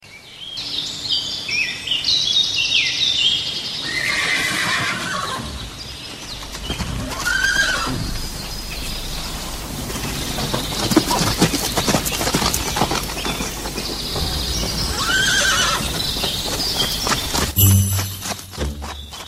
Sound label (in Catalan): Rondín Pikotín Flab